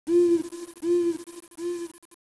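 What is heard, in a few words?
ambient, halloween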